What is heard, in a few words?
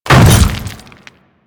Hammer Boss Step Dirt, Footsteps